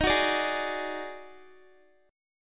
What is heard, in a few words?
Eb Minor Major 7th Double Flat 5th, down, Guitar; strummed